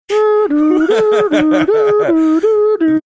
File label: making an ambulance siren sounds